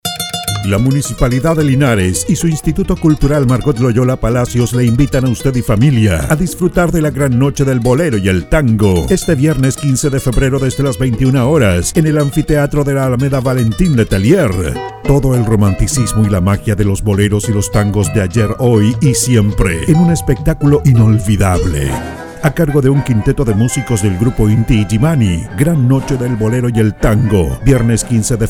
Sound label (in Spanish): Bolero Tango; Noche